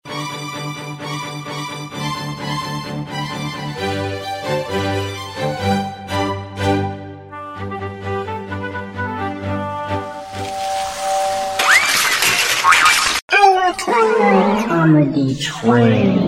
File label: train